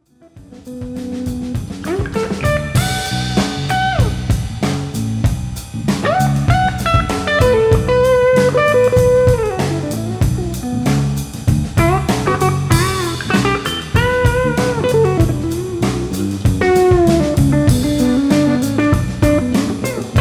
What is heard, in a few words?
Blues Party